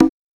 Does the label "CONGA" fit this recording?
Yes